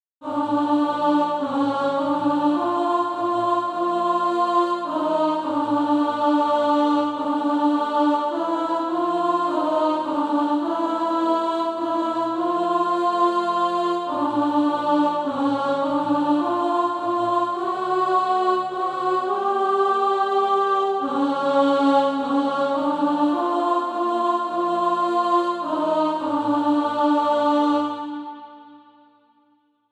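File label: alto, Practise Music; Father in Thy Presence Now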